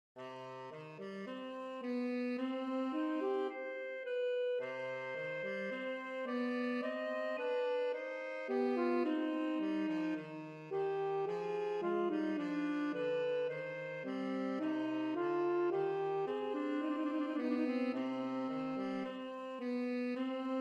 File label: Adagio Duo Sonata in C Minor; LOILLET de GANT; Saxophone Duet